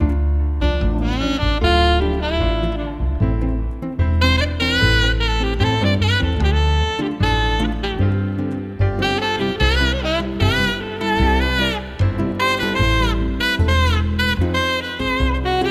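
Ofisnaya Fonovaya Muzyka Retro, office background music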